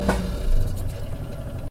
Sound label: turn off